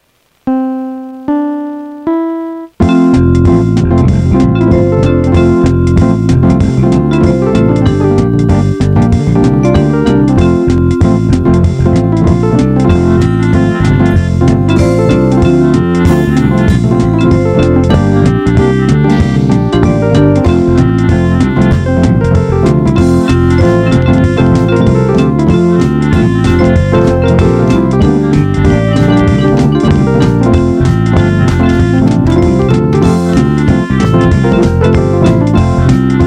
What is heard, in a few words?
Big Swing